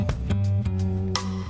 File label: limiter out; JaySynth